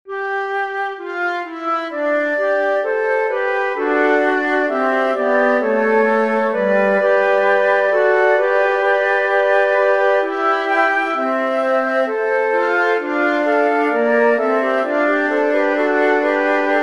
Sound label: flute trio